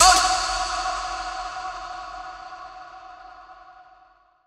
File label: Vox Fx; Zero-G Sound Sense Grime Scene; 90 s Sample CDs